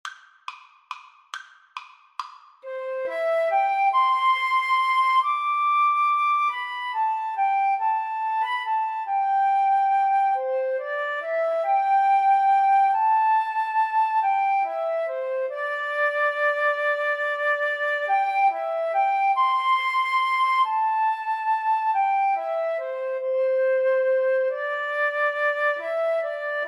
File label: morning has broken, flute duet